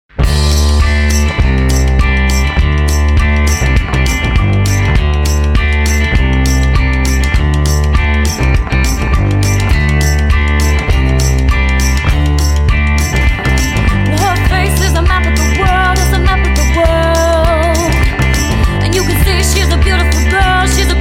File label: Rock Pop Band